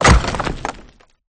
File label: building cancel; sfgame